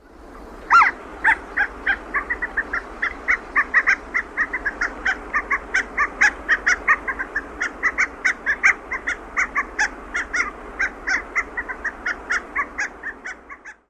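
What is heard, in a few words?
call variant, harlequin duck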